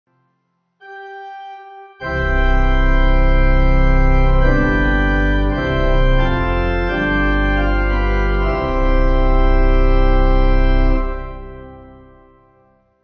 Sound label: Morning Service Pipe; Lutheran